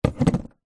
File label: household snow globe set down table; zapsplat